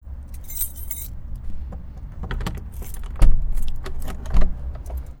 Key And Bolt In Gate

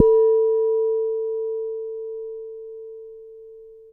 Soft Hit, Large Wine Glass

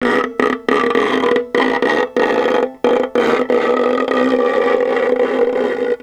Violin Extended Technique

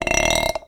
Bolt Glass Scrape, Medium Wine Glass

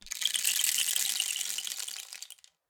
Rain Stick, Percussion Samples

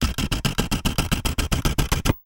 Camera Sequence

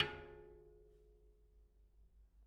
Bicycle Spoke

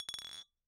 Bottle Struck

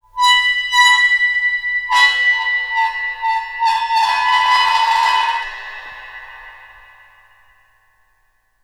Cymbal; Percussion Samples

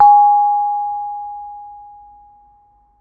Bonang, Gamelan

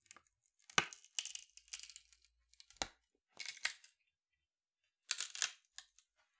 Palette, WoodenPalette

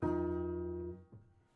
Prepared Piano

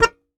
Very Short Note, Melodica

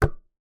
Key Noise, Melodica

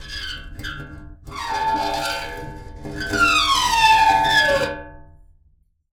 Pane Of Glass Rub

